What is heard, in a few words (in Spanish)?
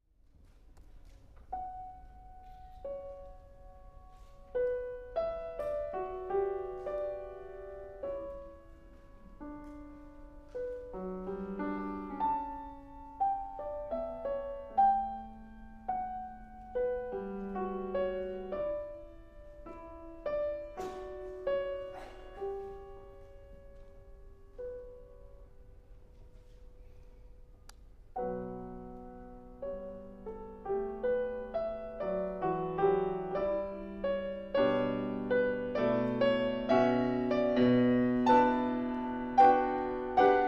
IMPROMPTU para piano grabacion en directo